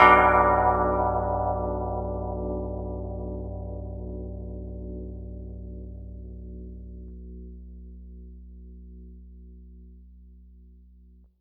Bell; demoniaca everlasting night

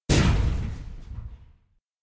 chariot Impact; Puddle; puddle plus ios gamerip 2018

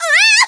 Windsurfer Impact; Kelly Slater Pro Surfer; macOS, Windows gamerip

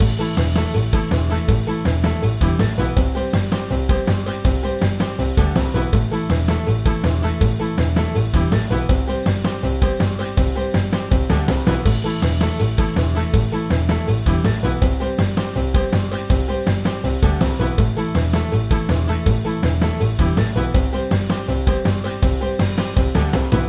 Backing Music

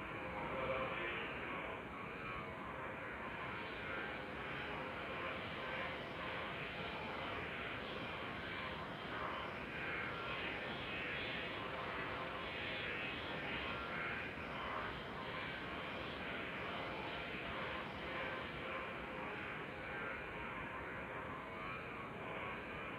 Ambience; Dt D Tertiary Ambience1

